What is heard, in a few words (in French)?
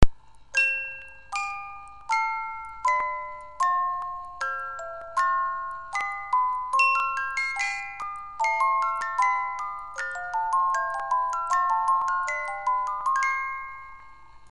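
canon pachelbel 18 lames, boite a musique; Boîte à musique suisse, type presse papier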